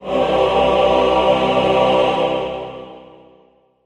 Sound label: Music Trans M Choir, choirs